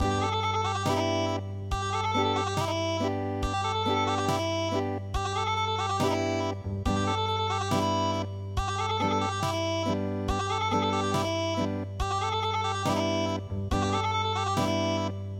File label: Unplugged